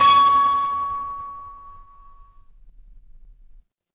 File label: instrument singing bowl